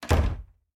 Door Close; MiSide

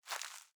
Rope Misc

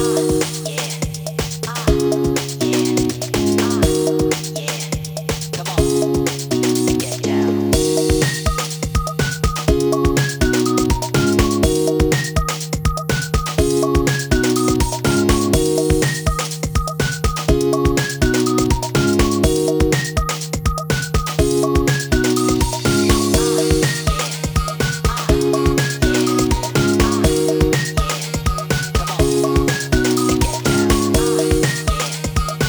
Prologue (Instrumental); The Amazing Digital Circus: Funk Off Reality

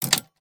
button click; gamerip

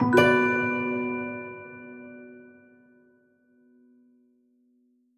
Crystal Hit Musical Normal; Cookie Run The Darkest Night VR

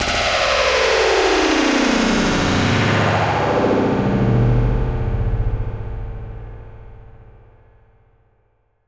Fx Impact; Fx Impact Join

